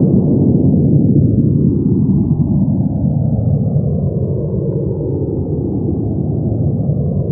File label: CRUISING, WARHEADS; Spectrasonic Distorted Reality 1; WARHEADS 2